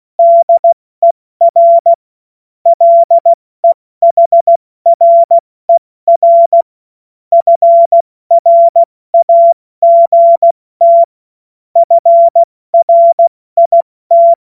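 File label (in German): Witz, Morsekurs, cw morsen; Witze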